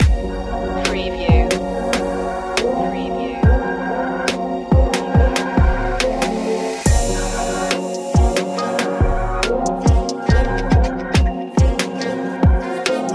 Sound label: smooth soul vibes loop joiner